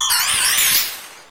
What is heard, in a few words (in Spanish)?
Grito de Minior